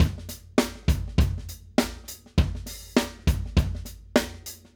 Acoustic Live Drum; Platinum Audiolab

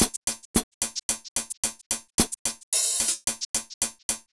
Phat Funk Hats 110; Percussion Elements

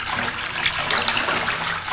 WET, WATER; AKAI S6000 CD-ROM - Volume 1

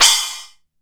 DANCE SET, DRUMS

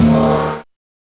Gong; Genesis

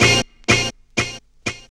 BRASS HIT

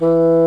WOODWINDS

